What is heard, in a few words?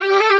vocal quick Gm; SD AURORA Sample Pack; Slate